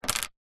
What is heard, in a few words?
comb plastic set down on wood table; household